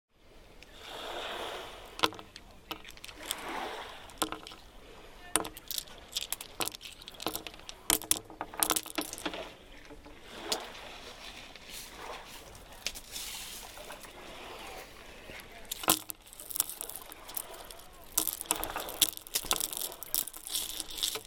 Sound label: stones performance on groyne; whitstable